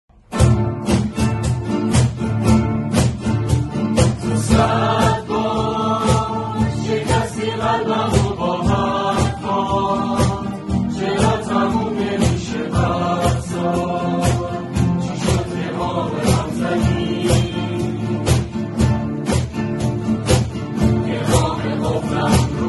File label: 100 Bar (Guitar)